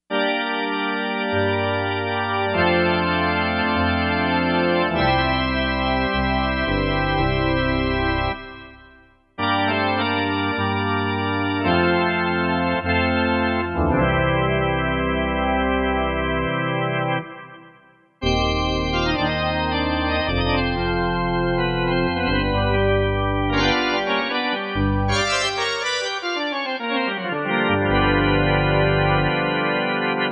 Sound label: DWGS Organ; Orchestra 1